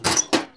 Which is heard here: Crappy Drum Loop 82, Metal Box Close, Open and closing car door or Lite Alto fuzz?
Metal Box Close